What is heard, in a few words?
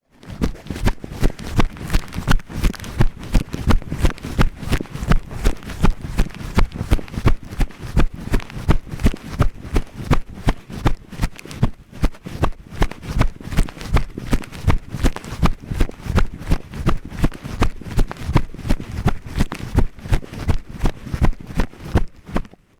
running with recorder in pocket